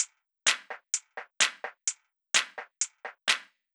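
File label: Drum Loop 128BPM Full Clap-hi-hat Add; VEC5 Drumloops; VEC5 Multi Loops; Loop Elements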